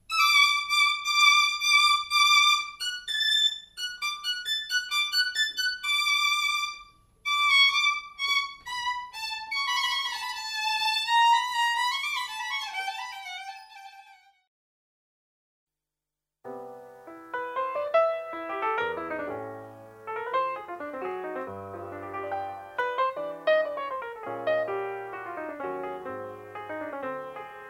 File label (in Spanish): instrumentos de cuerda